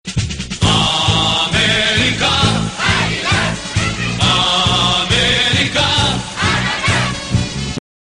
himno; aguila

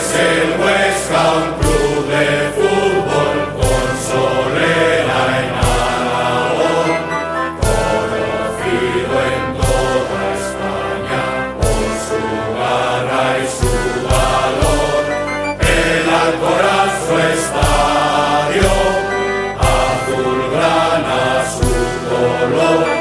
Himno; SD Huesca

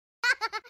risa enano, isketch

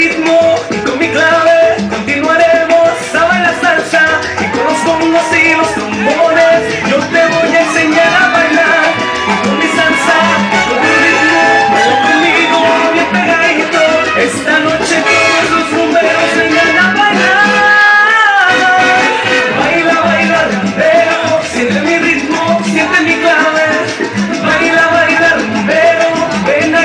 salsa baila rumbero; latino

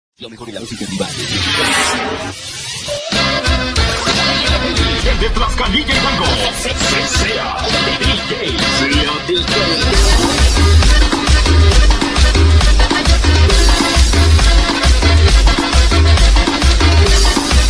tribal remix

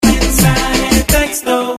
Mensaje Texto

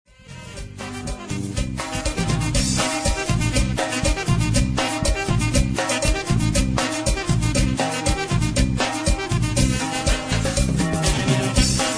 cumbia

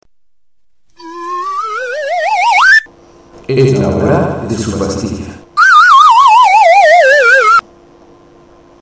alerta hora medicina; latino

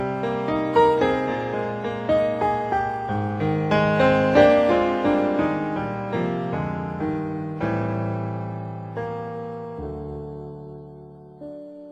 himno; real madrid

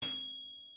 Microondas

